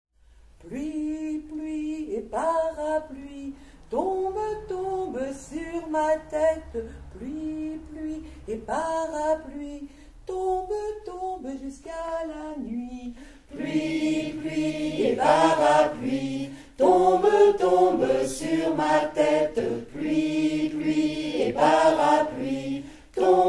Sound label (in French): pluie pluie et parapluie chanson pour jeunes enfants